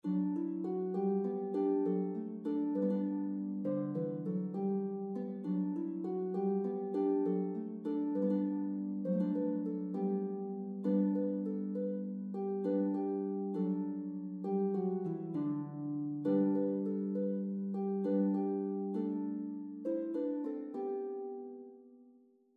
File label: Czech Traditional Arranged for Harp in G Major; Chtic Aby Spal